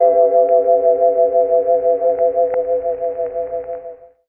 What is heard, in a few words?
vibros, Synths; Notes